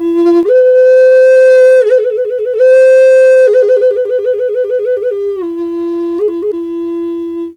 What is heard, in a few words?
F wild flute; Big Fish Audio - Didgeridoo and Other Primitive Instruments